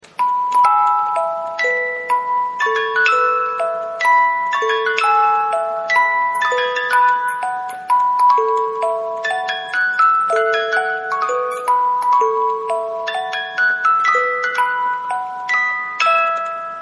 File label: 18 lames, boite a musique; Boîte à musique en bois personnalisable